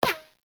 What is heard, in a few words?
Weapon Hit Effect Metal